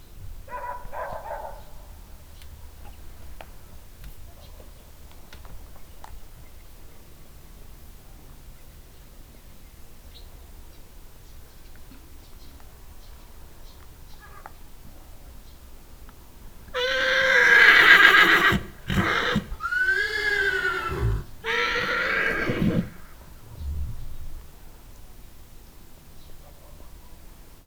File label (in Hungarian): nyihognak, ló; állathangok; paraszt udvar